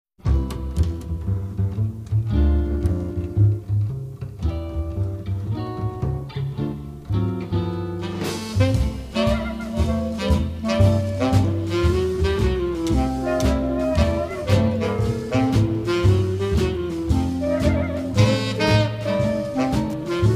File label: groove